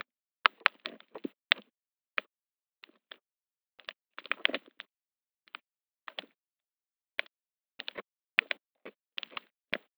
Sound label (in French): LAGON BRUIT recif; BORA; la pollution sonore; lagons